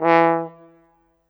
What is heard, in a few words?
Horn, Cinematic; Sonic Foundry (Sony Creative Software); Orchestral Series VOL-3; Cinematic 20